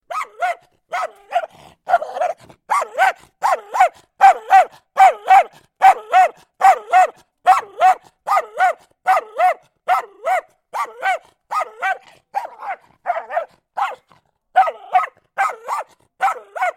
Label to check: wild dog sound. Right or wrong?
Right